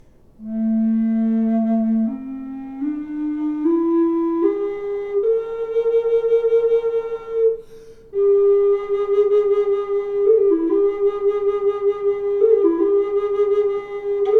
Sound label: reverb, Flute